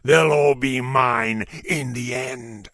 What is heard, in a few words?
Necromancer Warcry